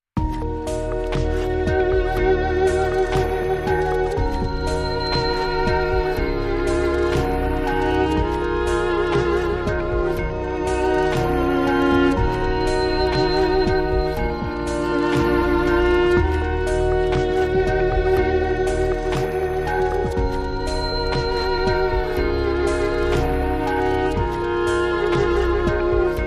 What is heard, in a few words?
Dark Electronic